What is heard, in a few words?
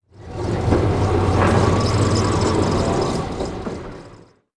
waste; buildings